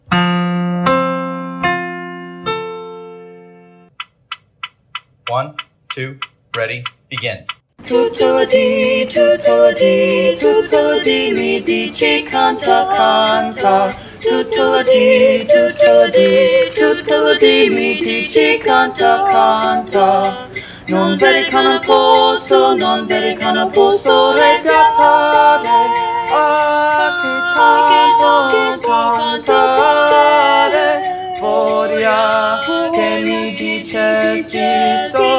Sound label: bass; tutto lo di